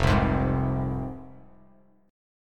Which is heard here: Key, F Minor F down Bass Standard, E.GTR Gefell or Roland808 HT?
F Minor F down Bass Standard